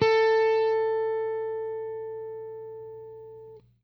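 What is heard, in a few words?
jazz line, guitar; Quantum Leap - Guitar & Bass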